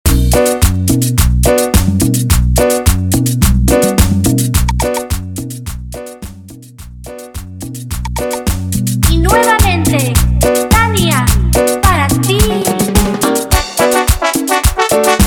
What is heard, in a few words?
Trumpet Intro 107 Bpm